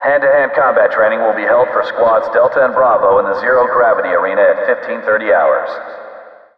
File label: iron ambient; allegiance